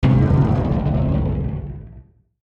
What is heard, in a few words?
Hit Descend Heavy; Descending; Blastwave